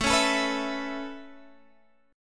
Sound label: Db Minor 7th Sharp 5th A